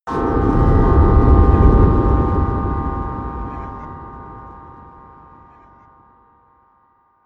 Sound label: whoosh short metallic